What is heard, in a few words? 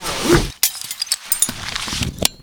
Gas Mask Equip